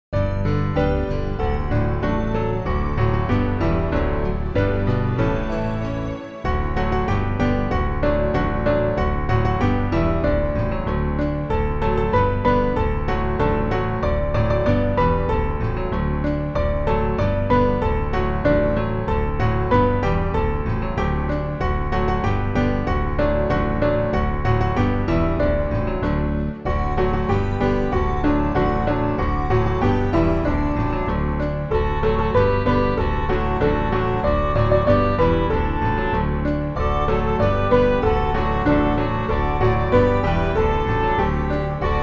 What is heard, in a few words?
instrumental; New Hope Music